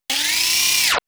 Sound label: Mini elec motor